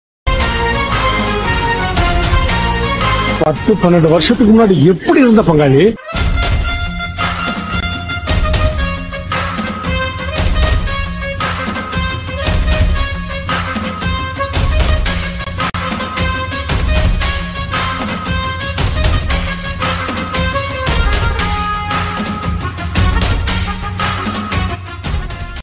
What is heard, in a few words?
rowdy bgm; pudhupettai; tamil